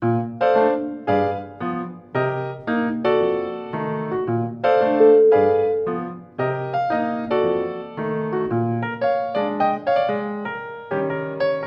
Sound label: jazzy piano